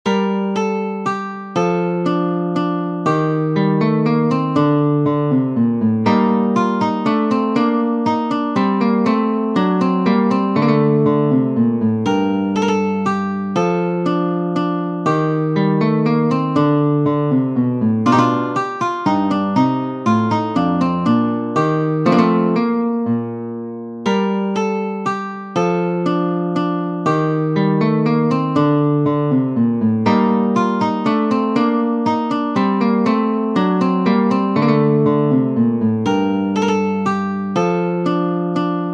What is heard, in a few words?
Menuet in G minor, classical guitar; Christian Petzold; BWV Anhang 115